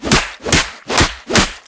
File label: fist barrage; battle